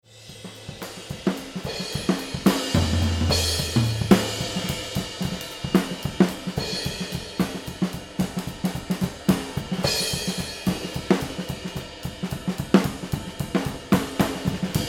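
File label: Mix Drums overhead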